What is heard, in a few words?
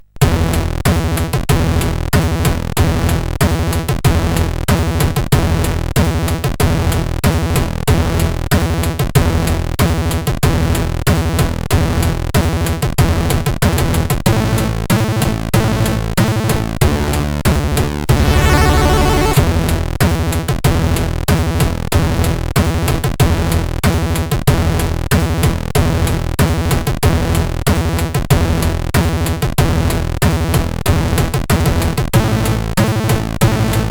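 Millitary, MOS 8580 SID chip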